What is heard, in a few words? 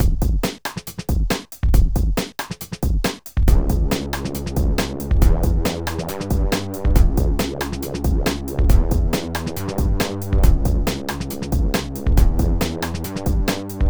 synth